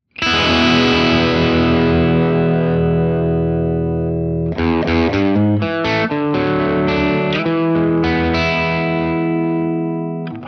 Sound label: characteristic sound; jensentone